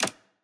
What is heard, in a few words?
Safe Click; Puzzle